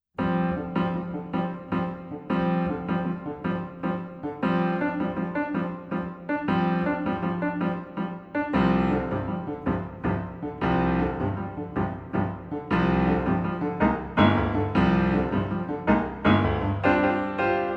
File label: Samba Rhumba